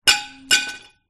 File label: sport baseball bat drop hard surface